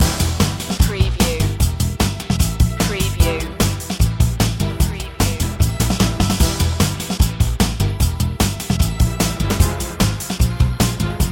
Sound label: chip monkeys loop